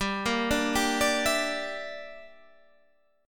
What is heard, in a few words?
E Minor 7th Flat 5th G